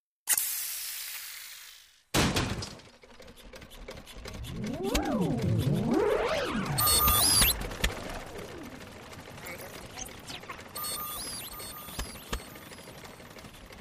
Robot Goes Insane; Left, Right Separated, Air Hiss, Gizmos And Gadgets, Honks, Zips, Bonks, Whirs.; Cartoon Gadget FX